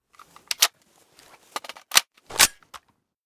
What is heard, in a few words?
ump45 reload empty, weapons